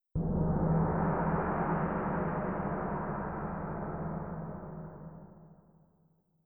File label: gong; Netease